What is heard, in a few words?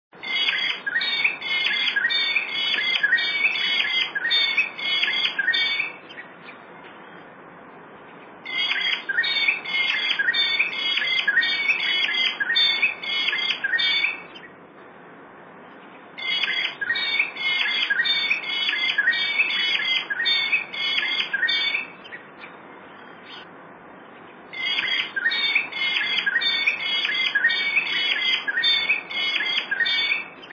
peewee